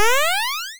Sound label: jump